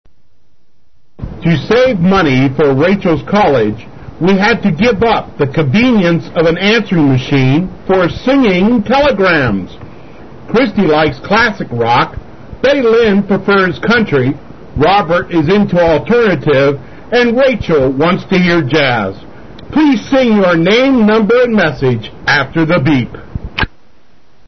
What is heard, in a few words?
Singing Telegram